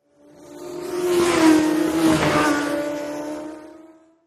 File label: Motorcycle Road Racing